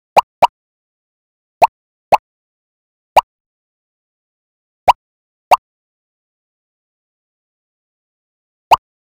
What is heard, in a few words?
single cartoon pop sound